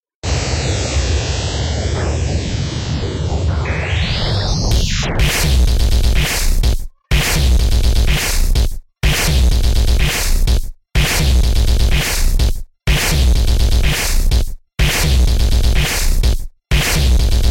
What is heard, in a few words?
Jazz